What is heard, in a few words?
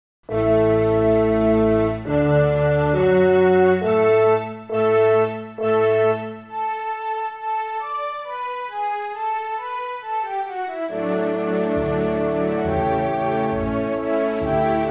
fl konzert orchester; mozart; フルート用伴奏CD